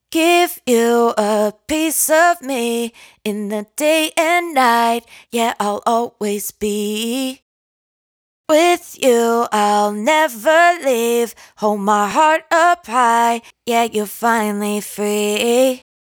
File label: hook 115 Fm, Dry Vocals; Raw Vocals; Piece Of Me; Slate Digital Omnivox Vocal Sample Pack